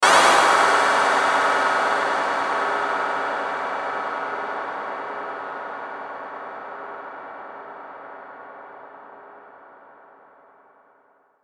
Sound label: Hit Long Reverb; sound design; Blastwave